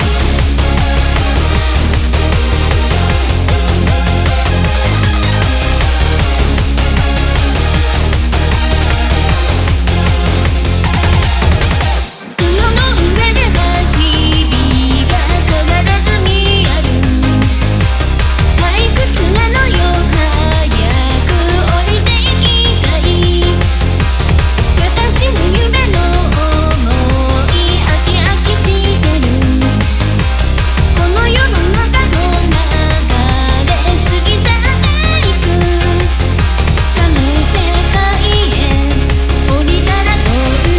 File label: EUROBEAT STYLE